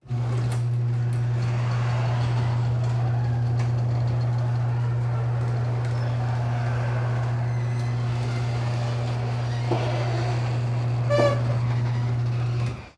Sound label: Garage Door Open; Household